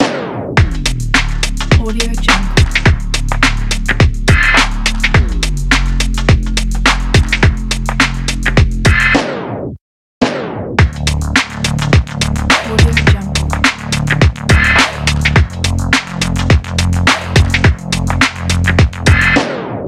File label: glitch intro